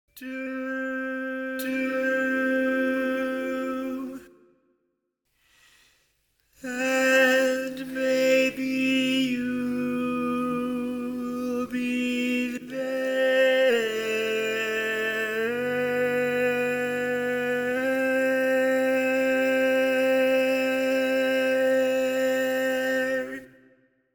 Lead, barbershop tags

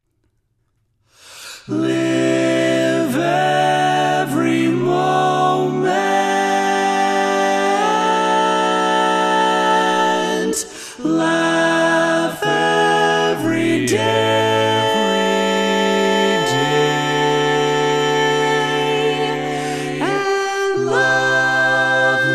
Live Laugh Love, Tenor, Barbershop

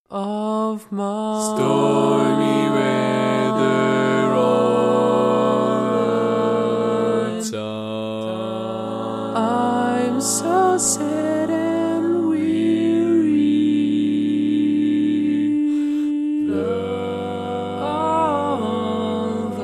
All Parts, barbershop

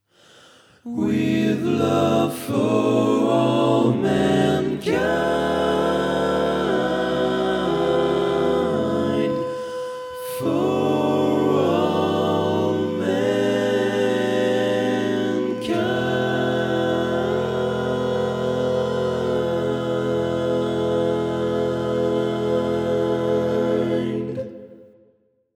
Lead, barbershop

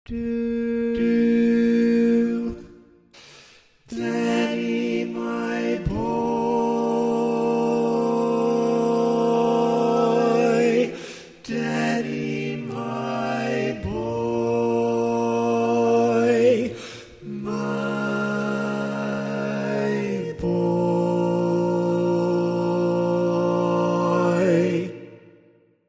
Bari, barbershop tags

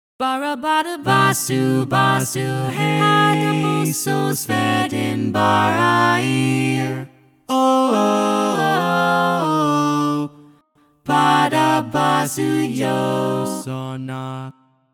SATB All Parts, Barbershop Tags

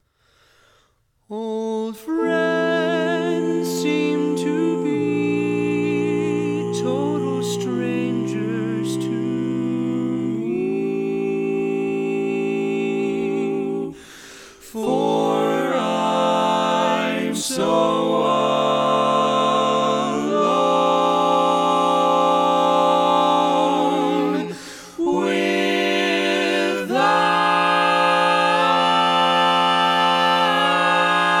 Tenor, Barbershop Tags

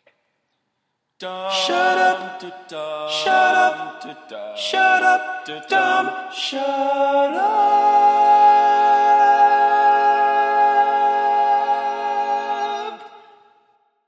tenor

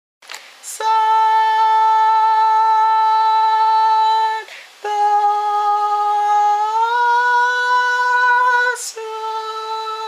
Tenor, barbershop

